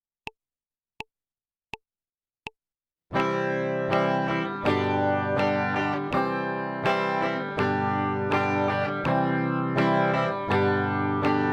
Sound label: chord change c g, guitar